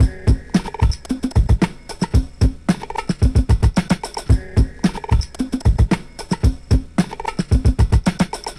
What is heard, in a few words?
beat repeater; glitchmashini